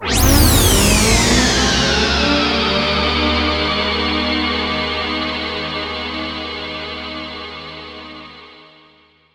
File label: JX3 SWP, Roland JX